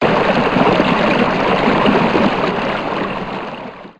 Stream

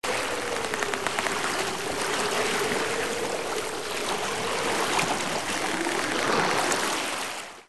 Harbor Swell

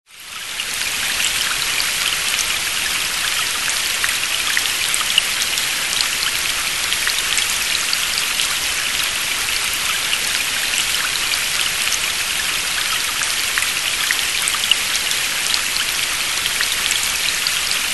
White Rain; karta dzwiekowa do s 560

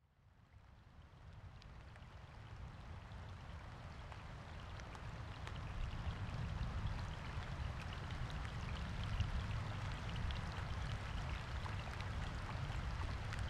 thunder and rain; sound oasis

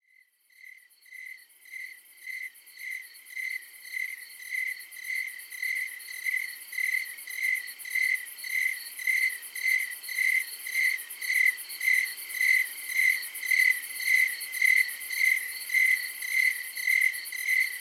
cricket chorus; sound oasis

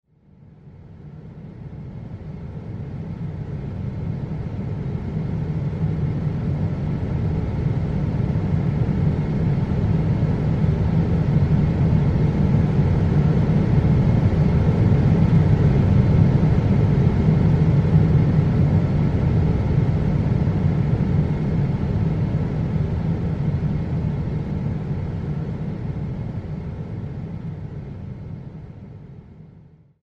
jet interior white noise; sound oasis

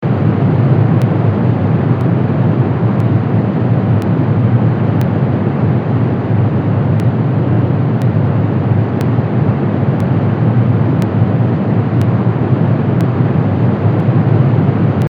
Waterfall; Wilderness Retreat

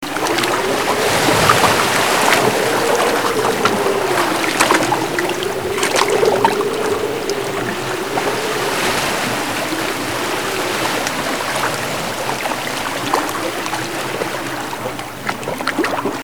Ocean Escape, Gusty Ocean Breeze

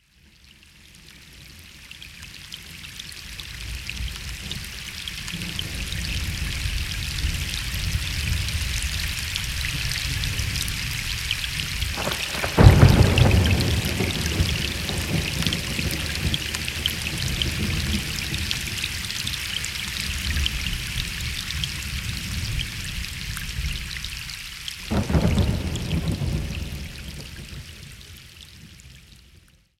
thunderstorm; sound oasis

